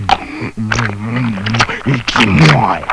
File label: candy eat